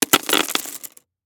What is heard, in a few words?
Tongue Depressor; Medical; Blastwave